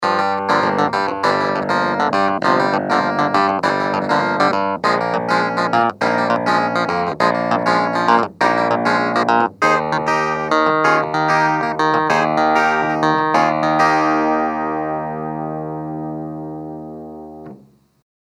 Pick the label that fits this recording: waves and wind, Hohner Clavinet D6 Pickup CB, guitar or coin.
Hohner Clavinet D6 Pickup CB